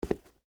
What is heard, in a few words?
Dress Shoe Down, Set, Down; Foley, Shoes, Dress, Shoe; Dressing; Human; BlastWave